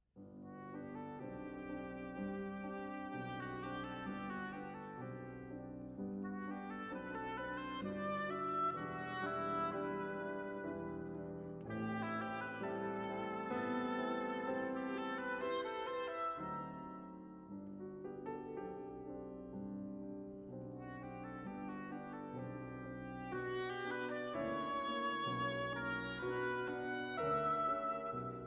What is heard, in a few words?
piano wind; poulenc